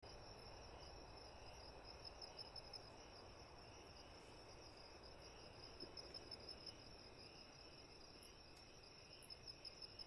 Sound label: Night Crickets of the Baja Desert; Nature's Soundscape; crystalbundle